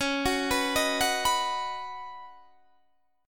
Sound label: C sharp 9th Suspended 4th C sharp down Guitar Standard, chord; C#9sus4 arpeggiated; JGuitar